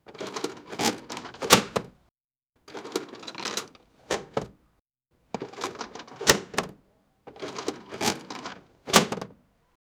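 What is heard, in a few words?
asmr sound a model showcasing; object manipulation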